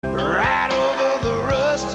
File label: paint commercial with the song; tremclad